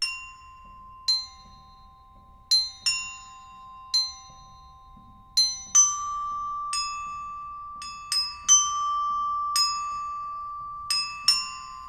glockenspiel

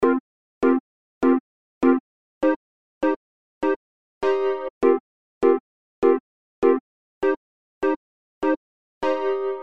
e piano loops

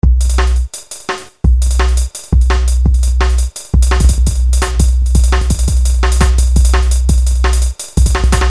Junglist Drums

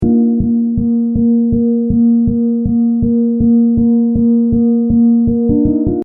instrumental

